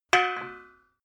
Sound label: metal impact pole